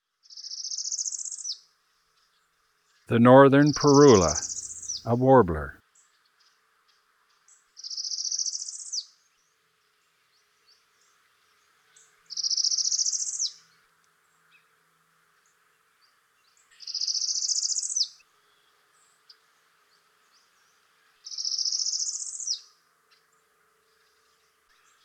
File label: Northern Parula; Eastern North America; Dan Gibson; Wildlife Identification By Sound